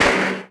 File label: bouncy table iron hit; bouncy objects; Tony Hawk's Project 8; XBOX